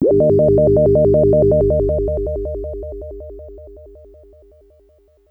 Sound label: Synth, UFO wavez